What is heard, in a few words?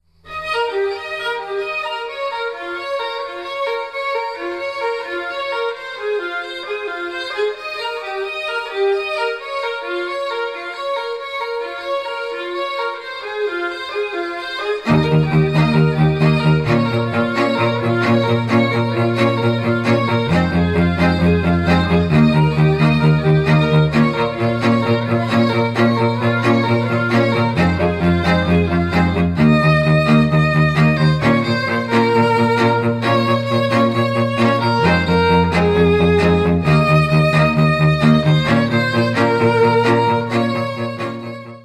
quartet, String Quartet